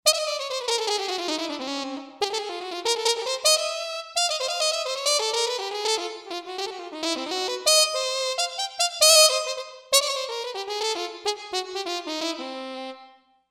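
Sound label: Brass Mute Trumpet, synth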